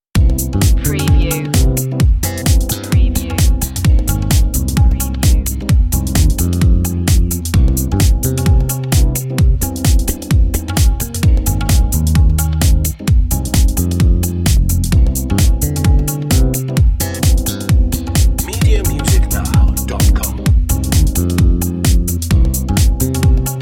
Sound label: ethnic night life underscore